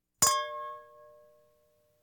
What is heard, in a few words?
Household Phones